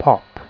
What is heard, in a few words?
pop